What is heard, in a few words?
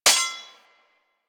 Melee Sword Impact Generic; Warframe; Weapons; Swords